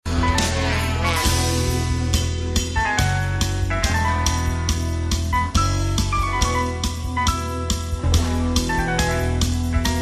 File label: jazz noise